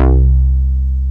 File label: OB-8 bass; Greytsounds VOL-1; OBXA